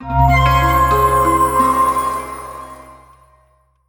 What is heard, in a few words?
Studio Start-up sound